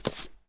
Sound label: U I Passport